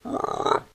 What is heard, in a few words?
mutant frog